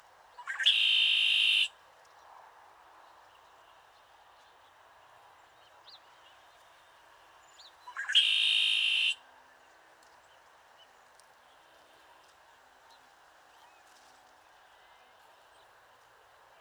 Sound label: Red winged Blackbird Agelaius phoeniceus